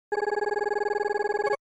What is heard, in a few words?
SNES Fighting Score